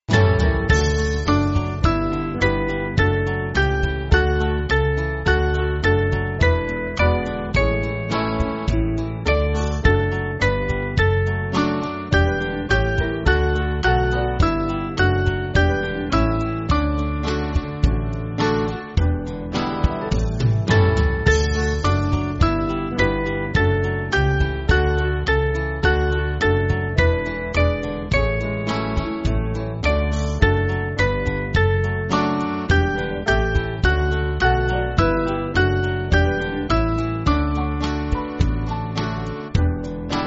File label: Band